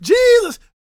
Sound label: GOSPEL MALE; Spectrasonics Vocal Planet